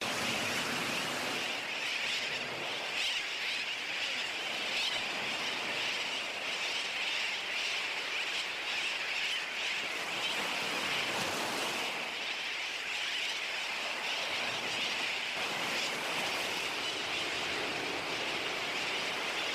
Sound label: Terns Talking At Beach